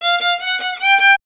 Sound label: STR VLN, Solo Instruments; Miroslav Vitous